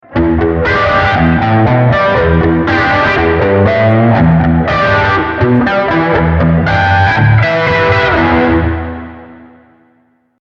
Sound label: Cube 80X Brit Combo + Plate